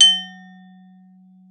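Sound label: Xylophone F#4; Xsample VOL-4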